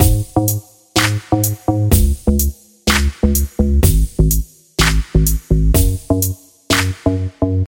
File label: Cool Beat